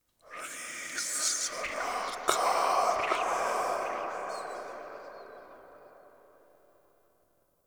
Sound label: Room Whisper; Warframe; Entrati; Dead End Secret; Dante Unbound